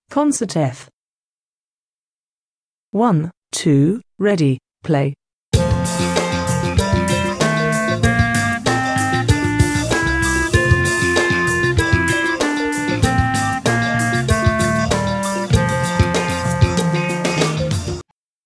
concert f scale

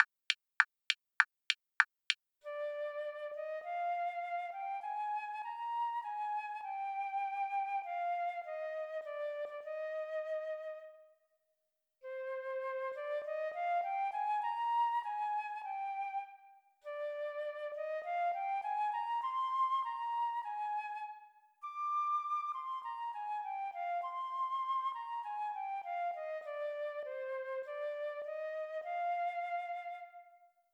flute fast

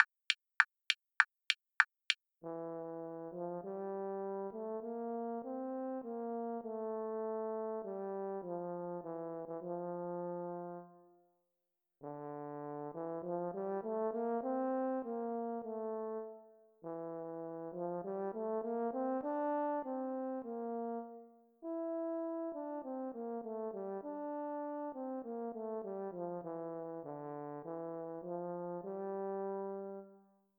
horn fast

